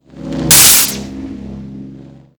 Lightning Flash; moul-assets

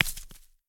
Rock Dirt Hit

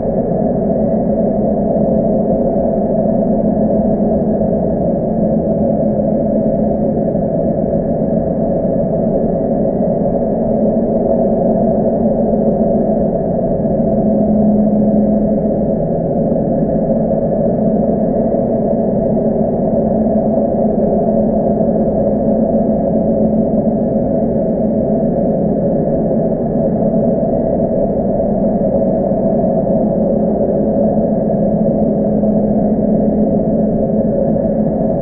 Tube Amb Loop

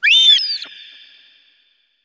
Lagoon Rand Bird; TLDN

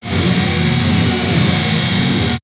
City Silo Beam Scan

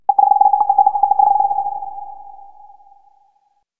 Forest Amb

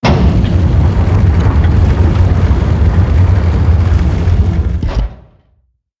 Node Door Open

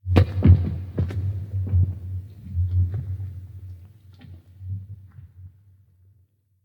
Random Ice; moul

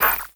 Grid Force

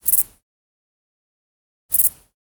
Desert Grasshopper

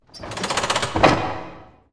Throttle Sw

